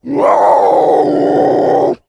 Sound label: Leopard Roar Ferocio; big cats